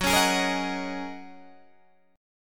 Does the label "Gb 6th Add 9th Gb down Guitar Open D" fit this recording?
Yes